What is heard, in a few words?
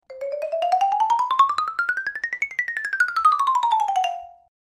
Xylophone Lng Up Down, mallets xylophone